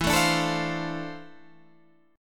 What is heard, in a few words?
D-Minor 7th, E; Dm7/E; strummed